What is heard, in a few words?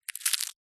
insect caterpillar bite eat leaf; animals